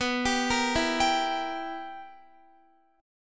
B Major 7th Suspended 4th Sharp 5th Guitar, chord; arpeggiated

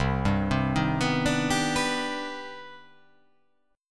C Minor Major 9th C

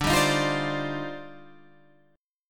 D sharp Major 13th, D, guitar chord

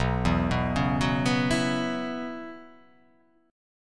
B Suspended 2nd Suspended 4th down; arpeggiated; JGuitar

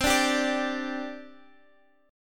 C Suspended 2nd C Guitar Half Step Down

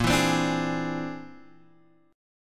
Bb 9th Flat 5th Bb down Guitar Drop D

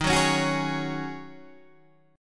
Ab 7th Suspended 4th Eb

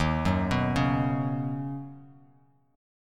Eb Minor 7th Sharp 5th Eb Bass Standard; arpeggiated

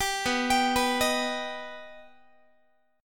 B Augmented B Guitar, chord; arpeggiated

